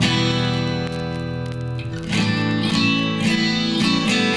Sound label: guitar, Jazzmatazz loops